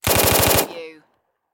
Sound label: Submachine Gun Thompson